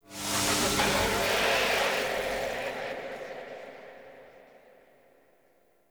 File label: Puzzle Mural Hint; Puzzles; Circade